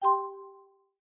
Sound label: click; drift boss